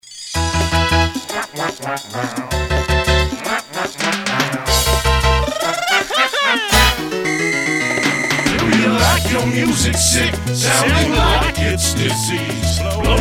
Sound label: salsa